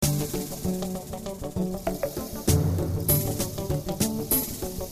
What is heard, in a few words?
Funny Funk 98 D7